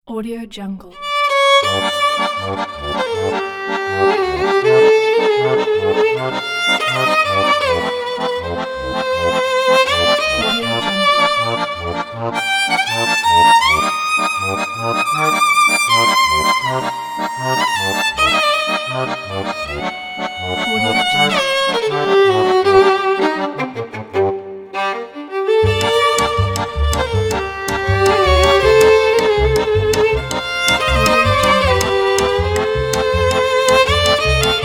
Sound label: quirky violins and accordion